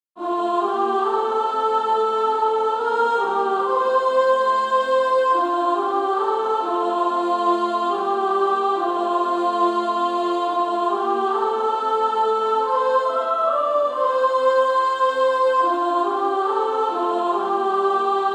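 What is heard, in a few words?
Female Choir, soprano 1; No Night There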